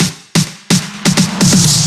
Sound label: Fills 128BPM, Clubsounds